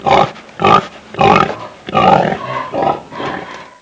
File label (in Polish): swinia chrum